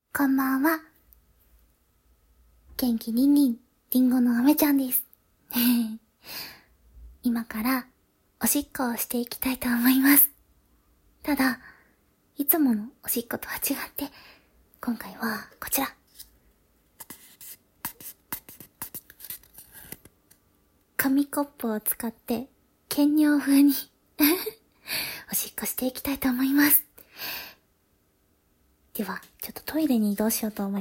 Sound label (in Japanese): トイレで紙コップにおしっこ